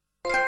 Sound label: playstation 3 trophy sound effect